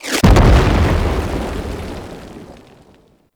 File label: distant cannon impact; Makvos; Warframe; Tau